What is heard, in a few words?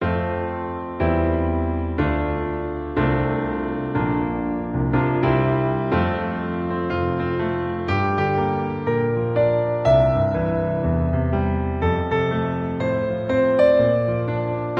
Piano Cover